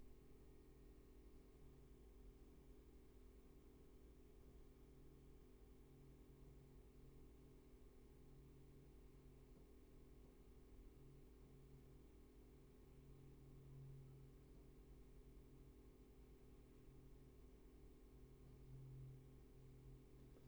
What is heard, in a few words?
roomtone